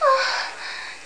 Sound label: MOAN